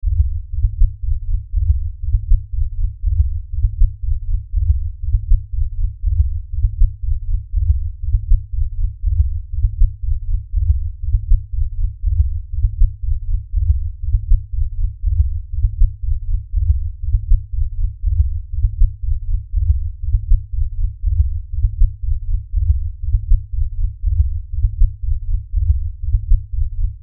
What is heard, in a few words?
Lfe Pulse Low Bass; Drone; Blastwave; Sound Design